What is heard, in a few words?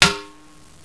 metal, debris